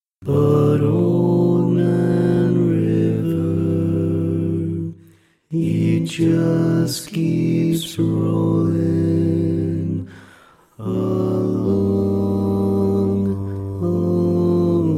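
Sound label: Baritone, barbershop tags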